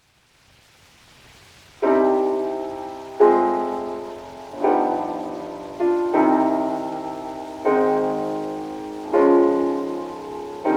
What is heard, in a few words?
Prelude; Benno Moiseiwitsch; Chopin; Piano Works; No. 20 in C Minor, Op. 28